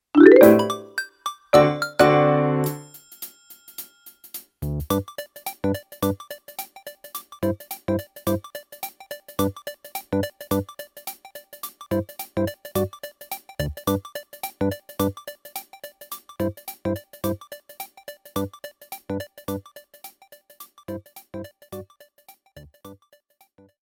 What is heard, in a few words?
Loading Screen Music; BlockFight